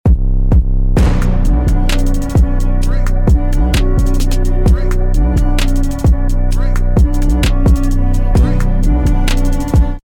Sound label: bomb ten sec count